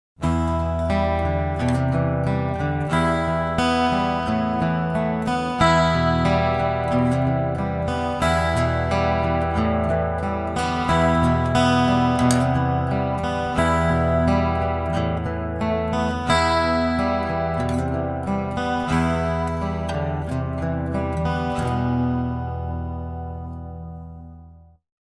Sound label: Flanger